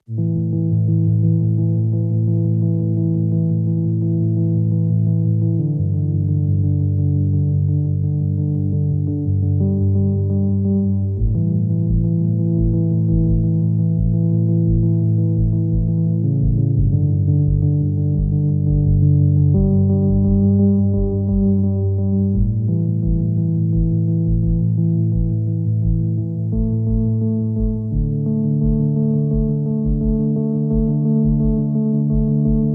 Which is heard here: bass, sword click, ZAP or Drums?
Drums